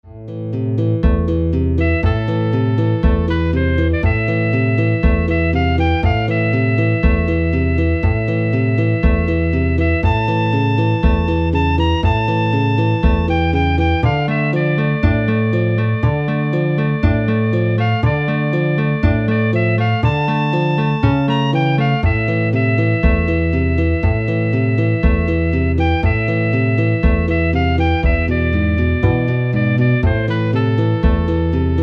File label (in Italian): chitarra; canti madonna; lu duluri di la matri santa